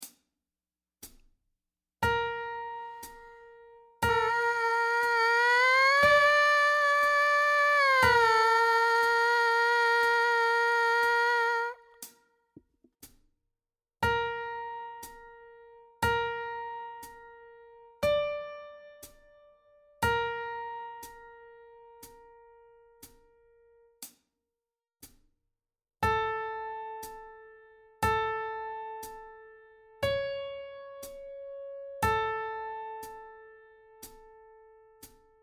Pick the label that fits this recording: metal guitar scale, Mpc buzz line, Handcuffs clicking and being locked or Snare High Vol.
Mpc buzz line